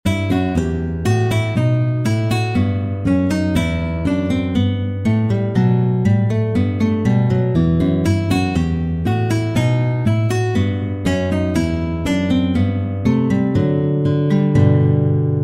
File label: Bourree in E minor; BWV 996; J. S. Bach